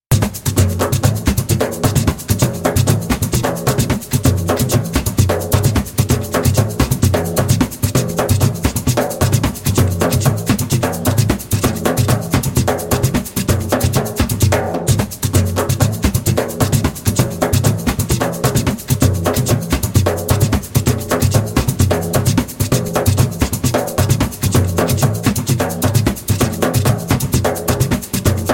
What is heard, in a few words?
Bendir Samba (fast) spoken; Djembolale - Grooves and Moves